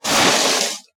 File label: aluminium shake